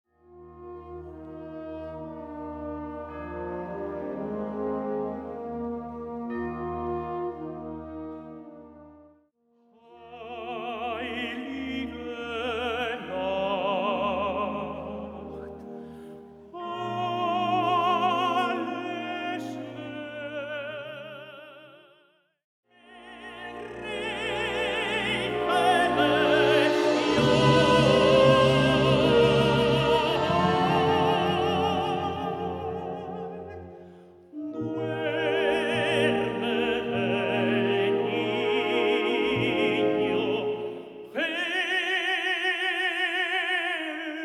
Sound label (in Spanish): noche de paz tenor y banda; stille nacht; silent night; villancico para soprano o tenor y banda